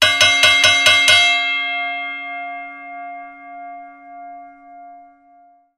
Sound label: gong; 2016 okinawa